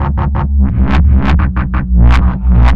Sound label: 173 Bass, Drum n Bass; KJ Sawka; Drum n Bass & Beyond